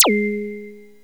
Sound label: Korg Z1